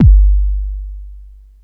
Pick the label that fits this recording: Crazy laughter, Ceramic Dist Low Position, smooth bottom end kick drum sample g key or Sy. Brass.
smooth bottom end kick drum sample g key